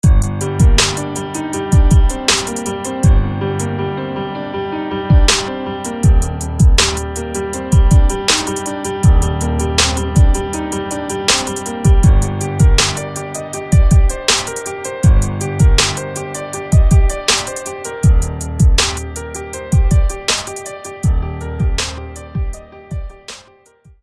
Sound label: Keys; Abyssed; SFB FX